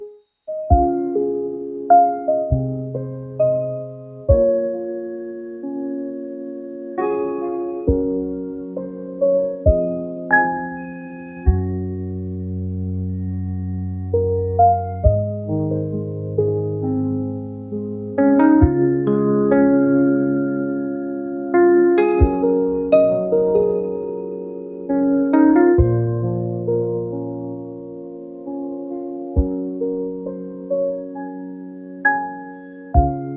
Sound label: piano calm